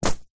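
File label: Flowers Rug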